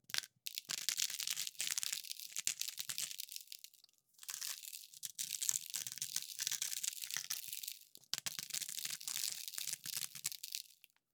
Salt Grinder, Turn; UberDuo; The Kitchen Audio Play Set

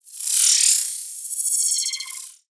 Sci Fi Transition; Digital Rain Lab; Sci-Fi Transitions

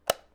switch, UI Audio; Kenney's Sound Pack

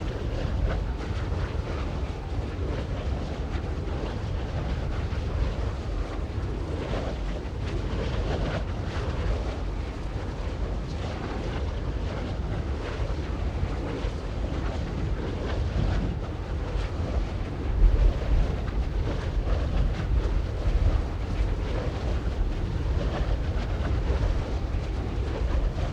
Wind turbine rear mid field MS STEREO; Ivo Vicic

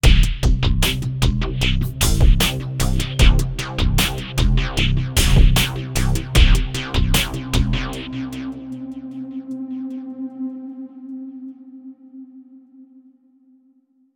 Signature Sound; FXHome; Signature Tracks

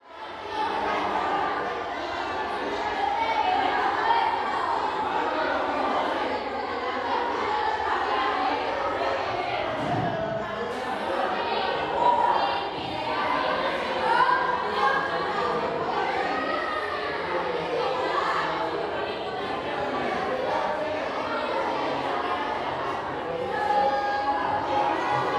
SPANISH CROWD School Cafeteria Kids; GainWalkers; Spanish Crowd Ambiences

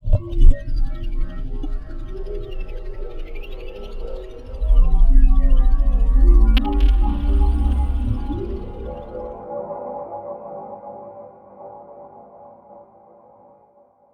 Eerie ambience; Bluezone; Eerie Ambiences and Sound Effects

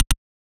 switch, Interface Sounds; Kenney's Sound Pack

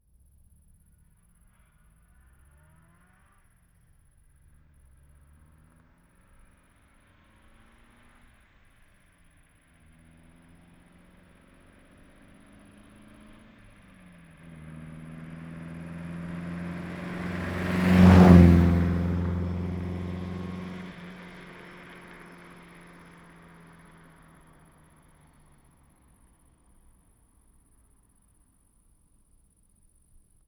MG TF1500 Roadside Pass By Fast; anno 1954; On The Fly Audio

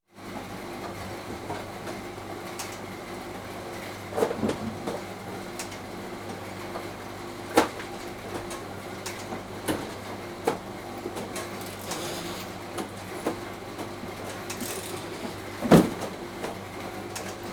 Plastic Milk Bottles Labelled, Farm Machinery; BBC Sound Effects Library

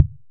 impact Wood heavy; Kenney's Sound Pack; Impact Sounds

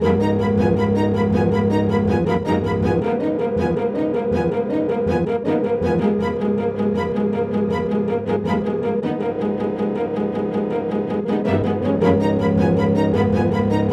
Loop G#minor 160 bpm; 99Sounds; Mangling Audio; Mangled Software; Midiception

